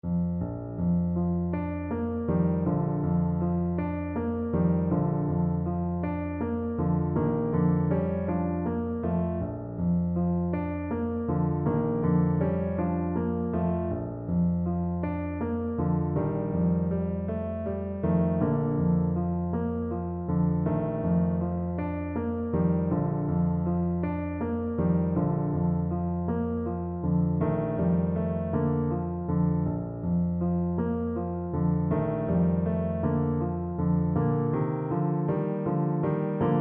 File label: lullaby, Trumpet